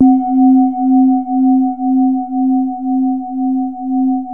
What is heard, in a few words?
BOWL, Tibetan Bowls